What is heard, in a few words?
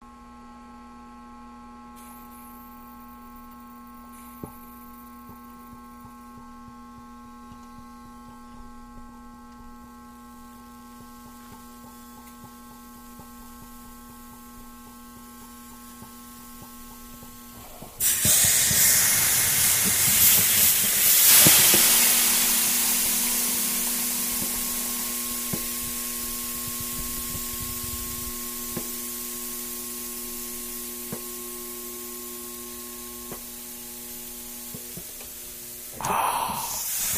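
Steam Metal Electric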